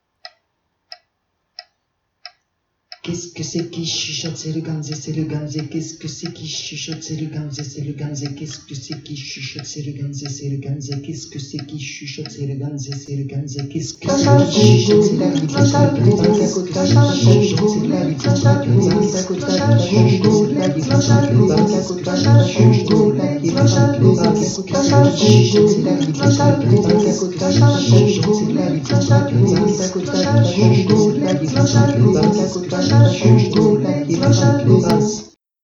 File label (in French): Batucada vocale ganza